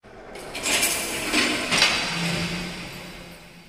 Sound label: move neon vent; ennard